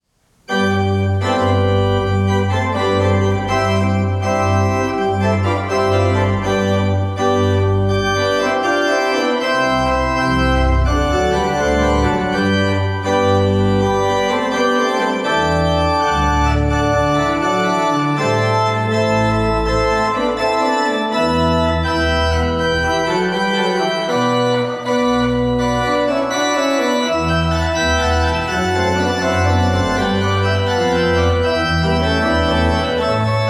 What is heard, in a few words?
processional in g major